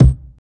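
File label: BD, hip hop R&B; USB Soundscan vol.19; AKAI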